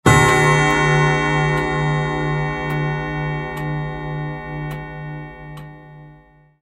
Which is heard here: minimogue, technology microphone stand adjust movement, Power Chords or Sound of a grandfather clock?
Sound of a grandfather clock